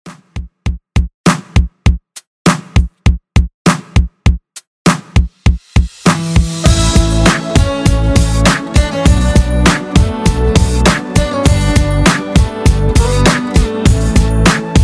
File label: 90s Redrum 100bpm